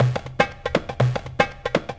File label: afrohead, Deep House 120bpm